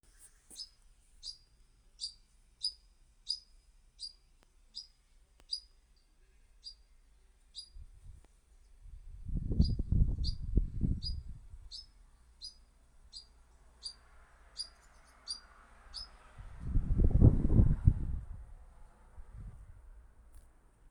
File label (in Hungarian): kormos legykapo hangja